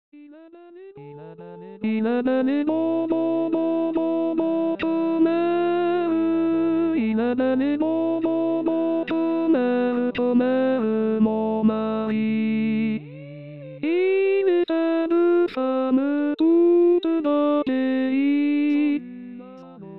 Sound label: Alto; Il est belle et bon